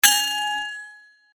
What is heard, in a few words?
Sword Sound; FXHome; Fighting Sounds